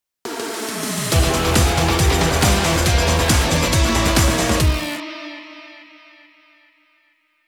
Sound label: Power Activation; Advance Wars 1+2 Re-Boot Camp